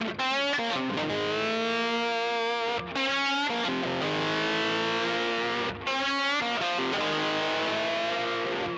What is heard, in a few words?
Slide